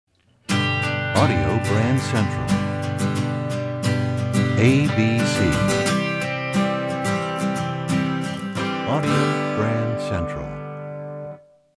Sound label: Desert Wind, radio jingles